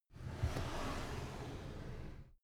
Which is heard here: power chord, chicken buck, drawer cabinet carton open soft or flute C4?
drawer cabinet carton open soft